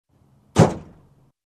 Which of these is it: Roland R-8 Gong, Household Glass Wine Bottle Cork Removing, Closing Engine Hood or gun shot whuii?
Closing Engine Hood